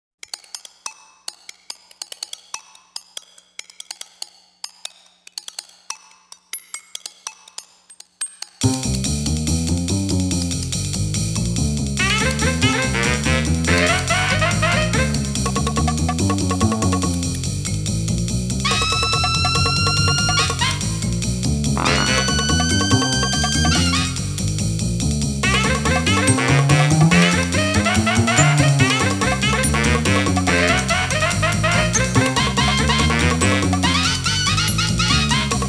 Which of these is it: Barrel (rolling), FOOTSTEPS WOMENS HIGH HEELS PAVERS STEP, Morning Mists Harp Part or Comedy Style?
Comedy Style